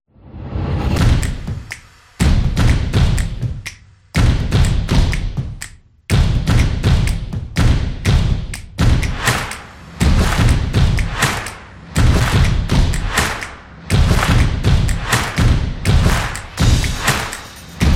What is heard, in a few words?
This Is Percussion Action; percussive nation vol 4